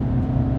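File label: muffled roar of engine of accelerating car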